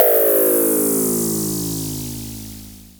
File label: FX, Dual Percussion Synthesiser; Klone